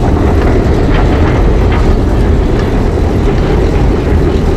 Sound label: airtight gates idle